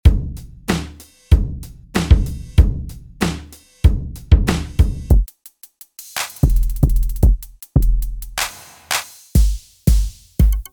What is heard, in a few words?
hi hats loops